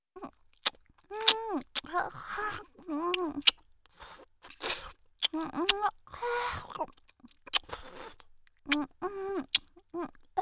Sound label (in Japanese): フェラ 激しめ